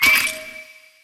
respin block break fast; medallion Desktop; Fantasma

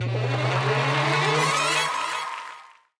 respin start; riviera Star; Fantasma

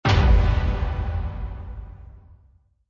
win huge end; Rabcat; Classic 243

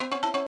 Credit Loop; Temple of Luxor; genesis

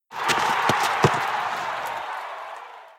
Bonus Wild Slide; genesis; euro Golden Cup Desktop

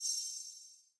blast tile; Skillzgaming; gems odyssey

